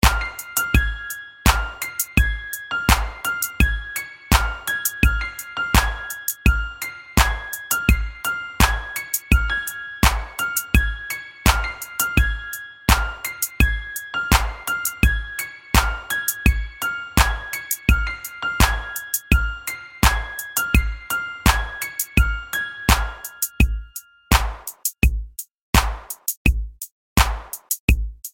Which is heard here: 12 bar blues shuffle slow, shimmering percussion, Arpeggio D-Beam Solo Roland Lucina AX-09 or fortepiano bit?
fortepiano bit